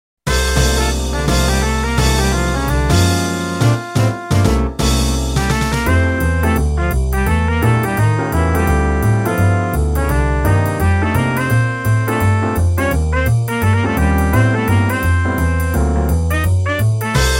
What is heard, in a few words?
jazz; kurashiki jazz street